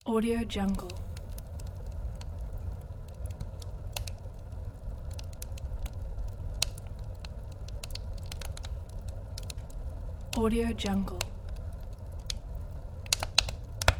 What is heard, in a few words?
the atmosphere of fire